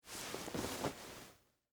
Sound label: Dress Shirt Fold, Foley, Clothes, Dress, Shirt, Fold, Over, Arm; Dressing; BlastWave